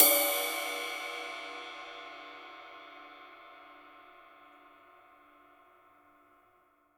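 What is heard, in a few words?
CYMBAL, real drum kit; HIPHOP KIT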